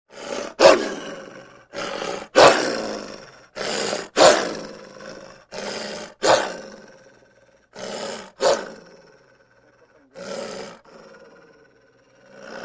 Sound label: Large Dog Vicious Growls; Birds And Animals